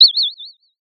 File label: Sound of a bird tweeting nicely